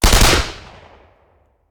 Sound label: Machine Gun; Sound Ideas; Guns